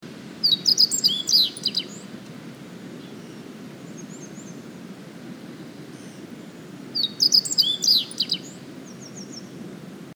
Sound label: wagtail